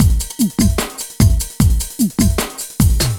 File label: LOOP, Eurotech; Sounds Good